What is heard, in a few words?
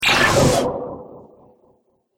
Laser Future Droid Repair; Laser Sound; Sci-Fi Laser